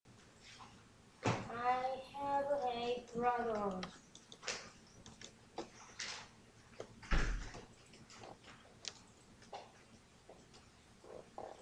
laugh

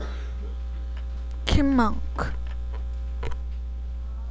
chipmunk

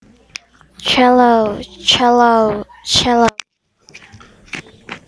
cello

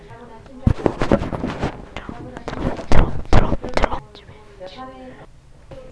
drum

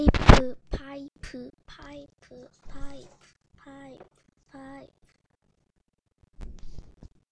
pipe

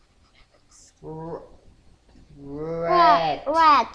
rat

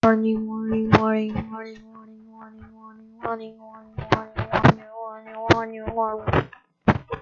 warning

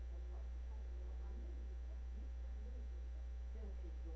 horse

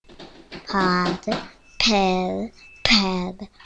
pad

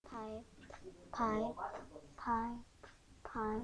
pipe

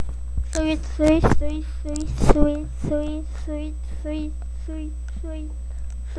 sweep

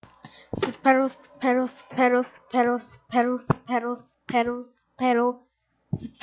sparrow

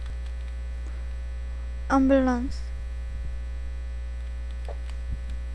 ambulance

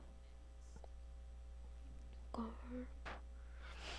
golf

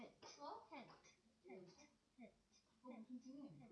hit

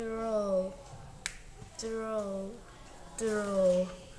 throw

